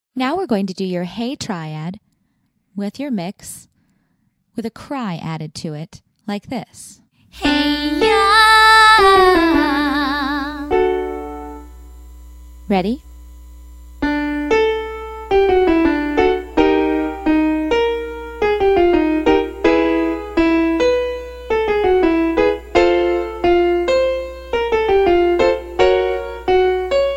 Hey Yah Cry Mix

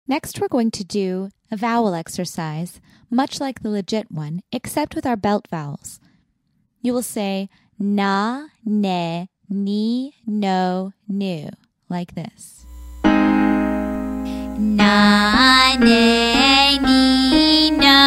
N Vowels

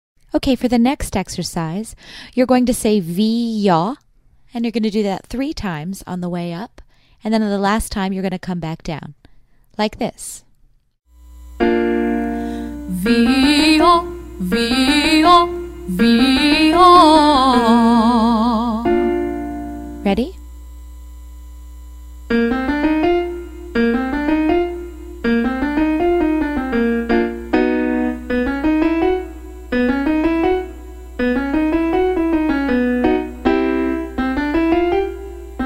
Vee Yaw

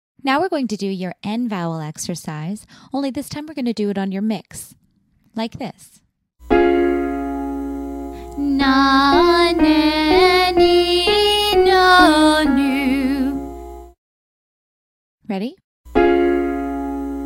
N Vowels Mix

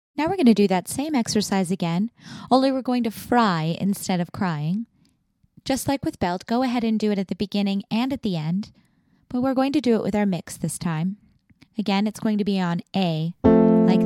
Mix Hey Triad Fry

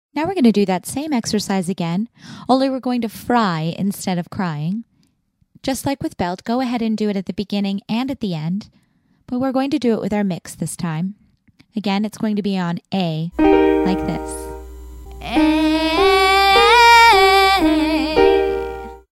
Hey Triad Fry Mix

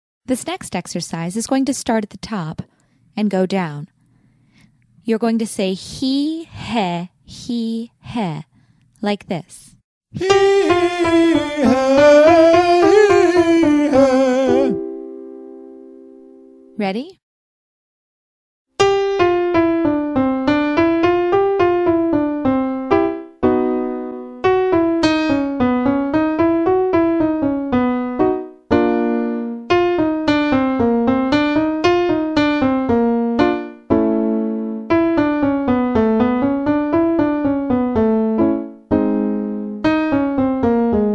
From the Top male

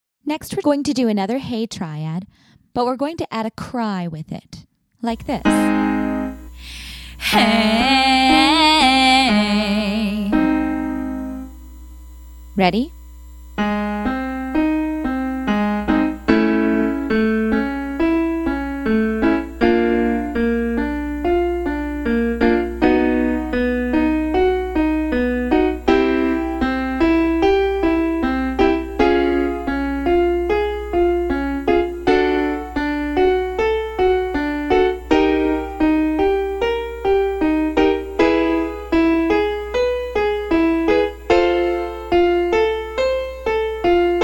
Hey Triad Cry

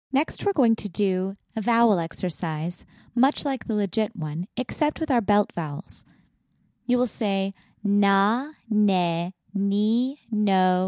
Belt N Vowels

